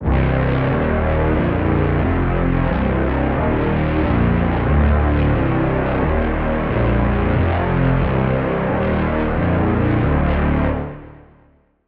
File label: Lead; Rugged Riot; Routed Sound; Vital Expansion - Street Vanquisher Vol.1